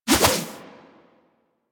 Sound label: Alchemy Lab Add Mineral To Meal Throw Whoosh; Warframe; Hub; Liset Alchemy Lab